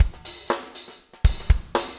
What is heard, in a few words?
4 on floor 120, loops, 120bpm